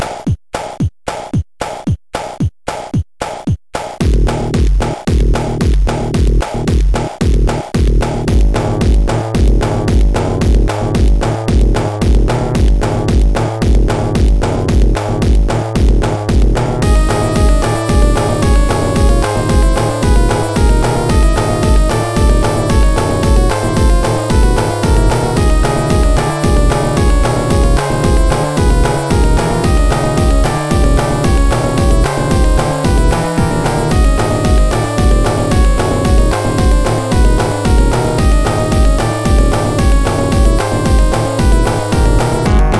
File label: spooky